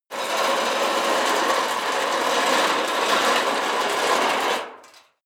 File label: metal big light scrape long